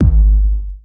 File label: kick, Heartbeats